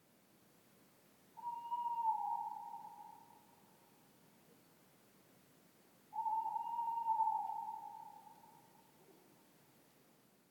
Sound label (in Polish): glosy ptakow puszczyk samiec; avesnature